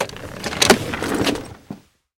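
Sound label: sharp opening of a desk drawer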